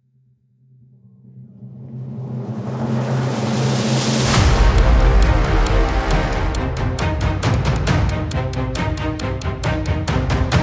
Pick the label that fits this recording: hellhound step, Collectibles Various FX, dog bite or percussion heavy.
percussion heavy